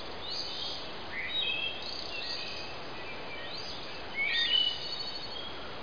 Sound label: forest; Driver